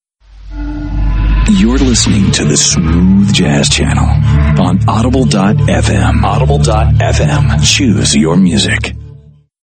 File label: Smooth Jazz; Audible FM; ADULT CONTEMPORARY; RADIO IMAGING